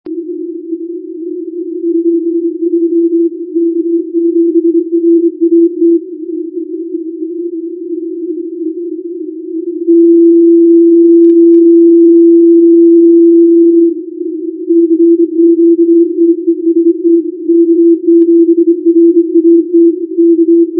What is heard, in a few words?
tuning shifting TX direction; k7rat